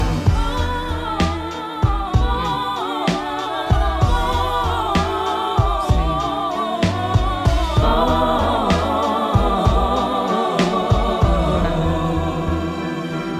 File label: foh oh oh oh allin